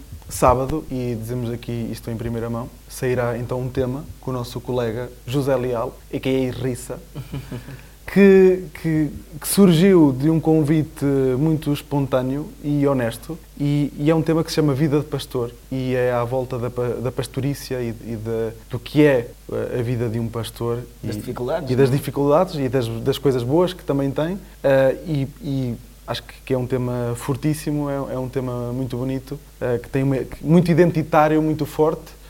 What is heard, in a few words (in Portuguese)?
batucada